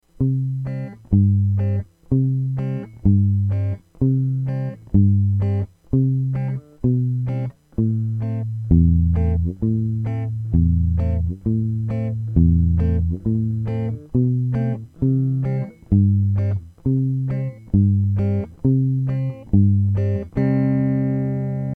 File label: alt bass